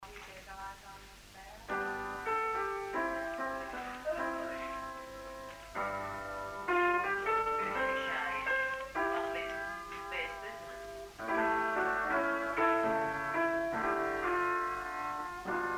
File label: mum playing piano; 3 on lap helping